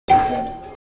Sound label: ding